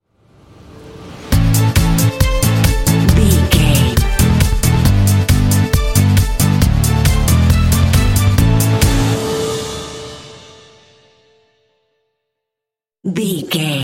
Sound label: electro club logo